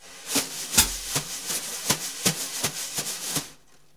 BRUSH, Jazzistic; Univers Sons

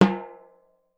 Timbale H, Percussion

